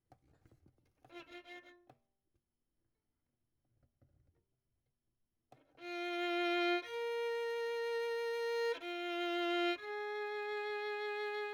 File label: Violin; Verdigris Music